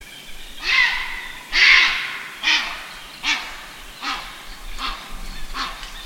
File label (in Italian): Verso del AIRONE GUARDABUOI; punto 2 foce del Farfa